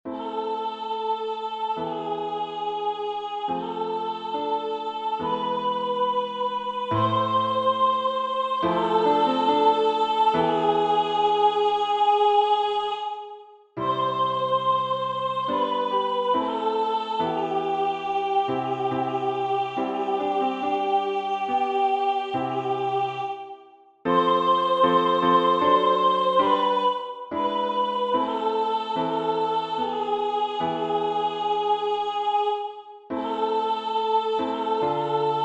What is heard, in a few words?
Adoramus Te Christe soprano